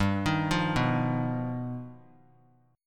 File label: G Augmented 7th; G+7 arpeggiated; JGuitar